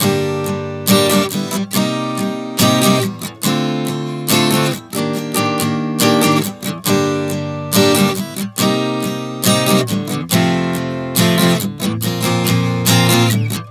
Progression 070 Gm-Cm-Dm-Ebmaj7 F-Bb, Acoustic Guitar, Chord Progressions 070 bpm; Sonic Foundry; Songwriter Acoustic Guitar Companion